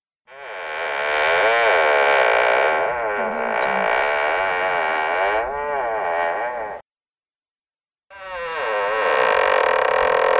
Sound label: creak squeak